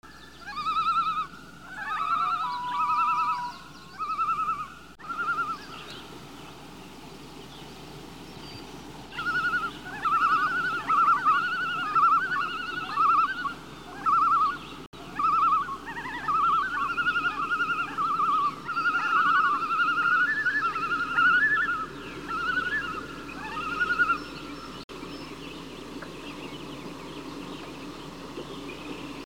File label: atmo birds loon